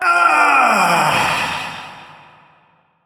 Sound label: Pain Long; New War; Ballas